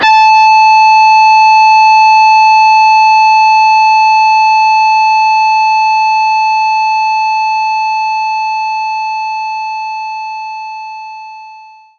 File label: Overdrive Guitar A5; knights try